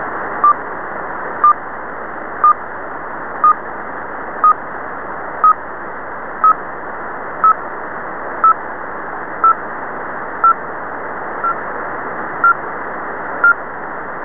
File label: clock